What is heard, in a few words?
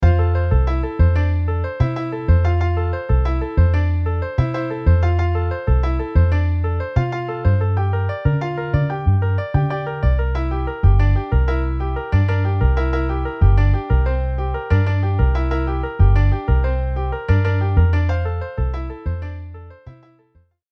Piano Bass MIDI